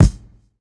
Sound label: reverb kick sound a key